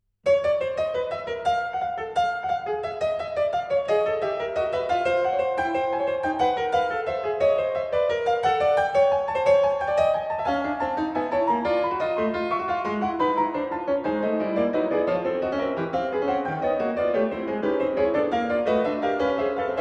Preludes and Fugues for Piano, Prelude & Fugue No.17 in A flat major; Op. 87